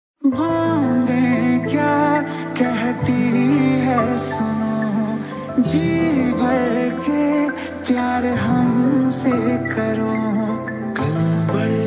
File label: Ringtone